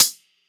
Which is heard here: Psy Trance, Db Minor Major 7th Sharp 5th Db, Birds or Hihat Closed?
Hihat Closed